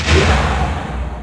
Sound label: RY-10, DRUMS + PERC, YAMAHA; Rubber Chicken Software Sample Bank